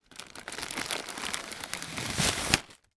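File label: foley packaging box pull out of cellophane; zapsplat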